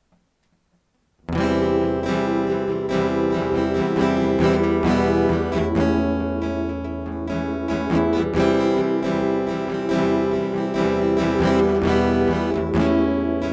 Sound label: Guitar Prelude; hyper terrestrial; Buttoned Lip